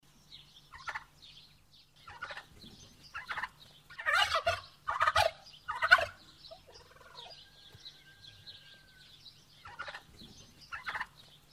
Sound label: holidays turkeys